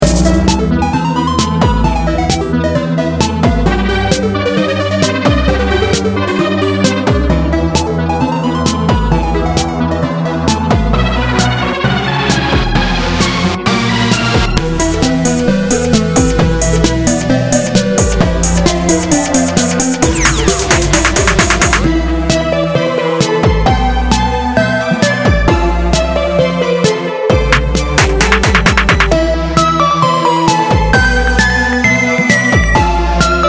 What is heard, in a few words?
battle